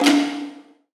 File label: High Perc; One Shots; Ghosthack; Drum Mega Bundle Demo; Quantum Force 4; Aphelion